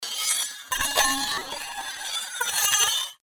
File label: Sword Sound; FXHome; Fighting Sounds